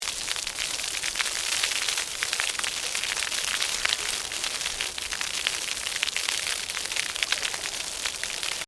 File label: Water Pour Concrete Medium Loop; Industrial Water movement; Nature